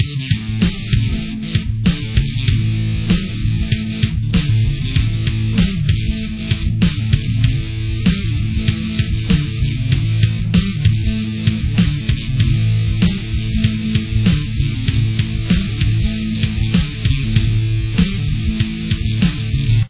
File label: chorus, guitar